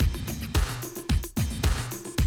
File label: Classic DrumLoops, 110